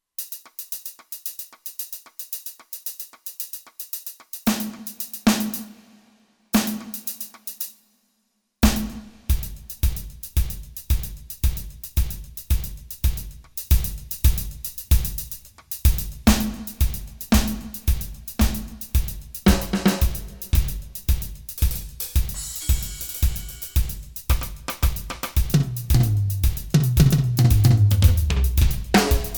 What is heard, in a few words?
Foot Drummer, Kurzweil K2500X